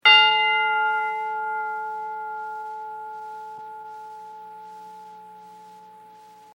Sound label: Tubular Bell Single High; Bell Sound Effects; Bells (Sound Effects:Musical)